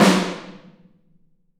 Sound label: SN, ST AMB SNARE